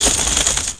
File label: crow takeoff